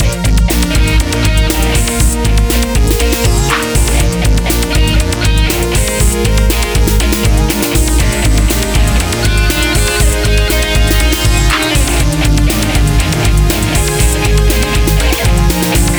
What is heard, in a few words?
Music